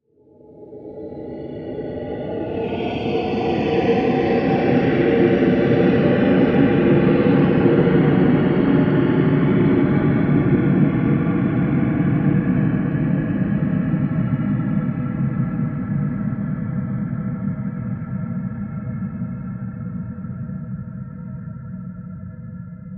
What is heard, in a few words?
Horror Breathe Spiritual Ghostly Drone Short; Scary Breathing; Blastwave